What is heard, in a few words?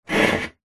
household computer stand metal slide on wooden desk; zapsplat